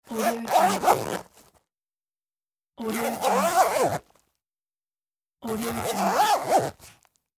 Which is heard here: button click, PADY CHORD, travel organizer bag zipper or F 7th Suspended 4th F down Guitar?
travel organizer bag zipper